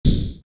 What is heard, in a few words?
rocket